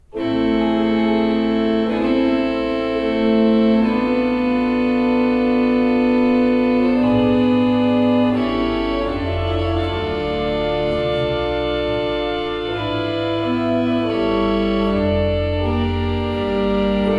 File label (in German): Euler II P, Orgel